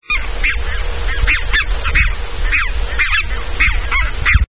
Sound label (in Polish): bernikla rdzawoszyja